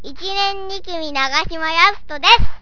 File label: f 11KHz